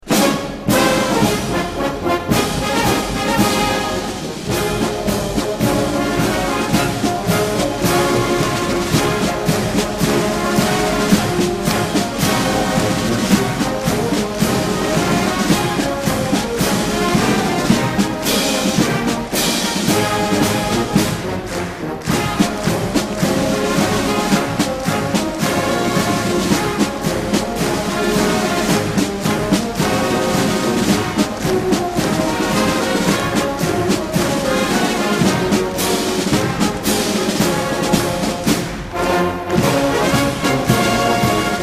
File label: March; Colonel Bogey March; British march